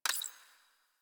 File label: UI Mod Screen Activate; DE; Warframe; Railjack Player Ship